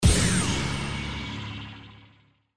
Blast

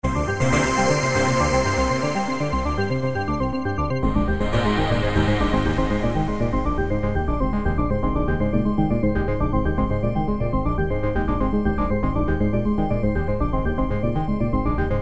Arpeggio

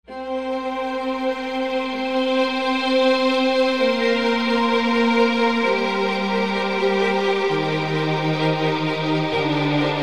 Musical Strings, cinematic

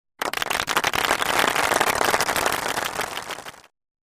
Applause; Soundzabound Volume 3

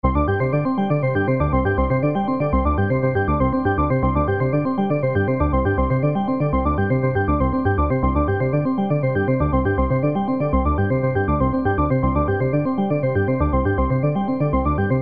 Arpeggio

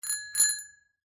Bike Bell

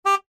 Car Horn

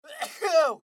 Sneeze

